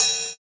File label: Cymbal, JUNGLE BREAKS DRUM SOUNDS; ORIGINAL JUNGLE BREAKS; JBK CYMBALS